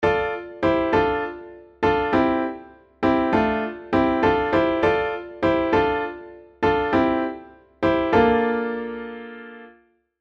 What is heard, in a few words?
Gospel MIDI Melody, Dm 100; Gospel MIDI Melody Collection